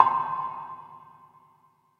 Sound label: pickup stones; diver jimmy